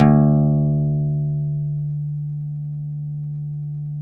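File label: pluck OUD D; Roland - LA Composer vol. 3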